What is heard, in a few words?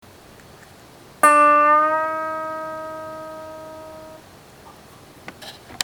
bend hanon